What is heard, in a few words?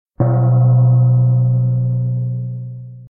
metal gong